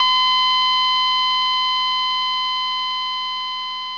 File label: flatline; Half Life Alyx; hev suit